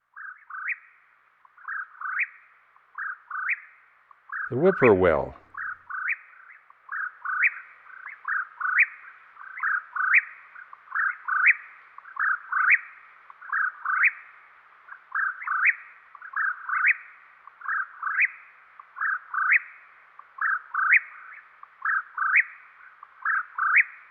Whip-Poor-Will; Western North America; Dan Gibson; Wildlife Identification By Sound

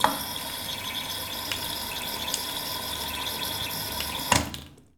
W.C. TAP, TOILET

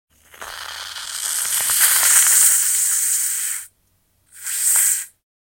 Squirty Cream; 100 sound effects

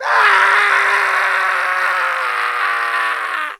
male scream; 1000fx; SCREAMING

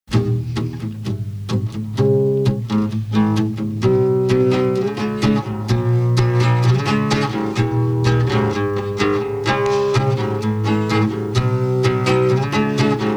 Solo Acoustic; Radio Appearance

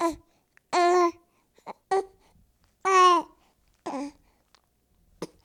GRUNTS, Baby; 1000fx

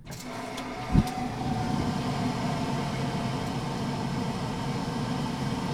gas heater; 1000fx

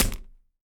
wood, smashing; 1000fx

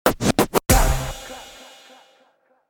Triple Scratch Punch; DJ sounds

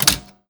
type; 1000fx; OFFICE

